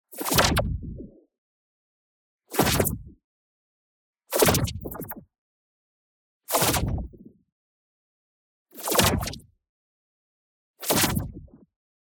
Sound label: ABILITY RELEASE Noisy Bouncy; boom library; Matter